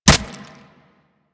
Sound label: Kunai Impact Flesh; Warframe; Hit Effects